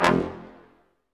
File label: BRS Quintet stac; Roland L-CD702